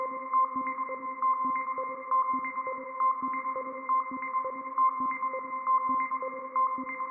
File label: twinkle